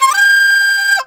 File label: CHINA, Heart of Asia; Spectrasonics; CHINAFLT